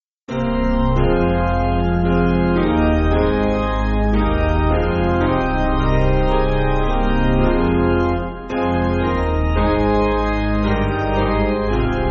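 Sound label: Piano, Music Backing Files for Churches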